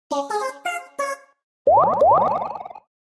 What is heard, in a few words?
heal sound; Omori